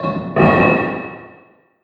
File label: Random Metal Drops